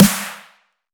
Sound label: normal hit whistle